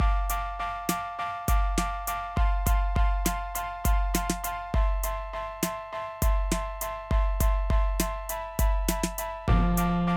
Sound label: beat; Hip Hop Instrumental